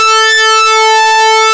SYNCSWEEP A, ARP Synths; E-MU Formula 4000 Series Vol. 3; Analog Odyssey; ARP Synths II

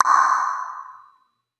mouth pop; Sonic Foundry (Sony Creative Software); Ugly Remnants VOL-2; Beatstuff

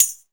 TAMB; MPC

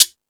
Percussion, Drum Machines E-MU Pro-Cussion

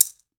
Latin Shakers, mexican

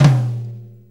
4-4 Tom Flam, Drum Loops; Drum Tools; Flams

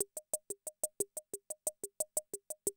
CR78, DrumLoops

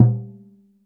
SURDO; Best Service Advanced Media Trax Modern Composer

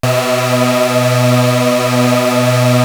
Prophet 5, B3; Hollywood Edge - Giorgio Moroder Rare Synthesizer Collection

